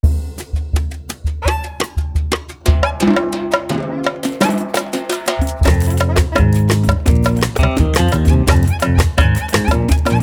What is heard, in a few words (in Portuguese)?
tamborim de prata